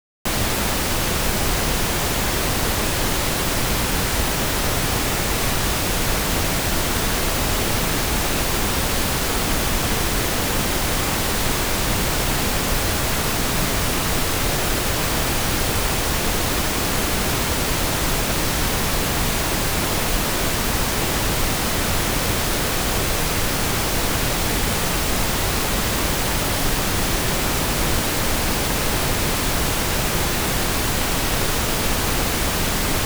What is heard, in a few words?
pink noise left channel